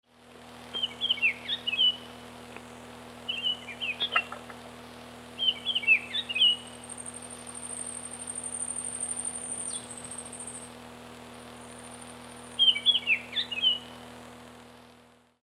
chernogolovyi, birdsong

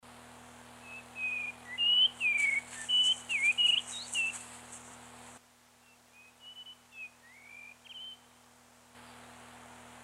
gularis, birdsong

